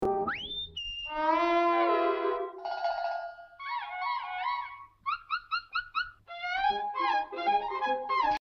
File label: Cartoon Whistle